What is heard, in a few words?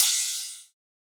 Drum Kit Crash 160, Trap Drums; Stems; Achieve Drum Kit